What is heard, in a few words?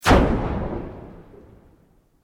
Sweep Organic Fast